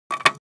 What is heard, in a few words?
drop front shuffled, small styrofoam longthin plank